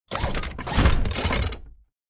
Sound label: alert idle to walk forward; Titanfall 2